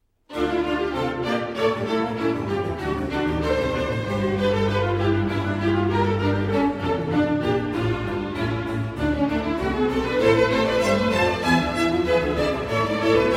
Double Concerto in D Minor for Two Violins and Strings